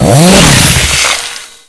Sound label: chainsaw hit kill